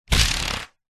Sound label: foley paper pad page rip tear binder rings; zapsplat